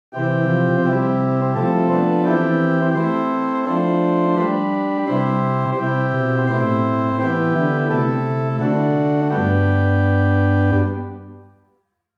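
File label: Open Diapason Large 8 + Principal 4, organ; St Annes Moseley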